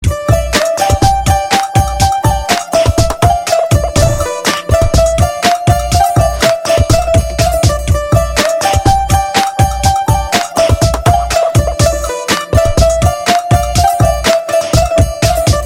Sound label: boom da bass